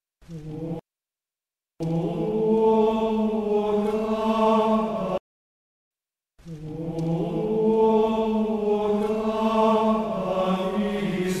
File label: O clavis, antiphons